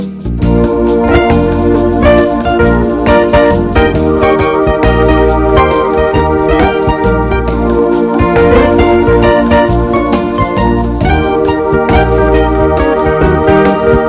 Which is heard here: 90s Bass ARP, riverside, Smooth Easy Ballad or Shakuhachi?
Smooth Easy Ballad